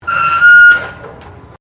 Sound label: Construction Concrete Metal Screech, Large concrete pillars being dropped